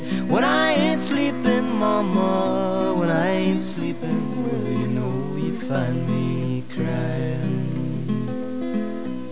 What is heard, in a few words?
blues